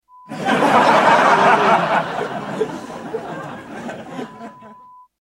Laughter

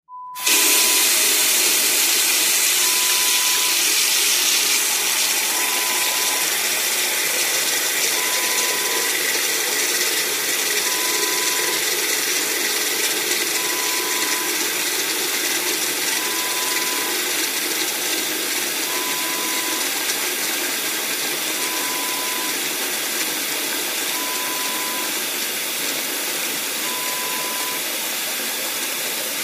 Faucet

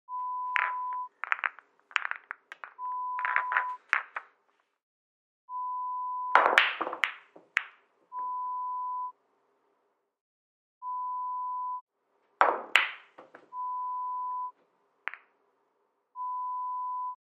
Snooker Balls

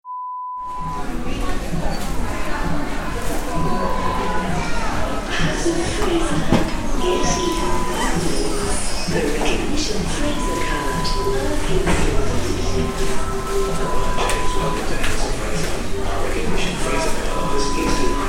Department Store sound

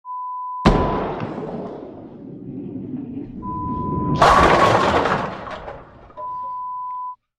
Bowling Strike

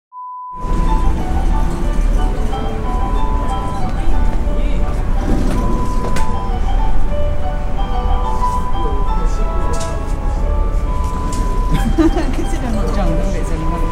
Bus station ambience sound

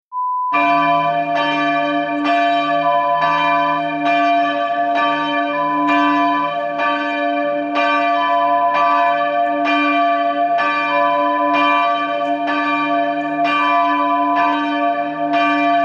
Church Bell sound

